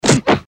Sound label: eastern fist hit seq part1